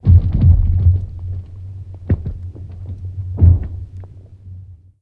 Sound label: Cracking; ImpGal2; colony